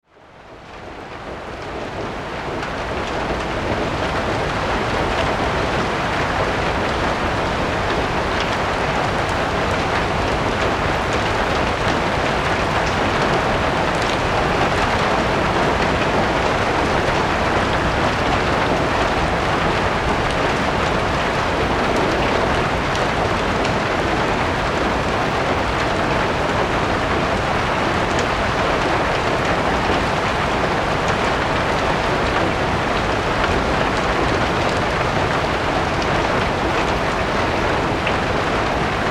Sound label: rain